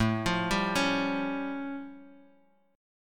A Augmented 7th A Guitar DADGAD, chord

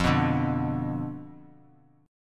B Diminished F, guitar

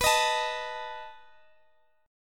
B 7th B down Guitar Standard; B7 strummed; JGuitar

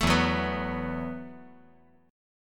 E Suspended 2nd Suspended 4th

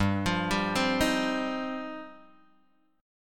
G 7th Suspended 4th Sharp 5th G, Guitar Open G; down

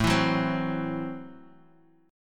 A Minor 6th A Guitar DADGAD

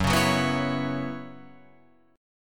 Gb 7th Suspended 4th, Guitar Open F; Gb7sus4 strummed

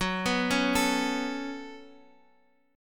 C sharp 7th Suspended 4th Sharp 5th, F sharp

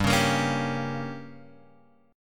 G Diminished, Guitar, Open G; down

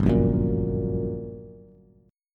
G Minor Major 7th, down, Bass; GmM7 strummed; JGuitar

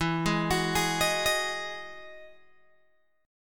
E Major 9th E down Guitar Open G

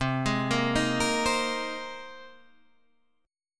C Minor 7th Sharp 5th C Guitar Half Step Down

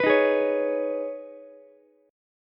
C sharp 7th Suspended 2nd, C sharp, down, Ukulele; strummed; chord

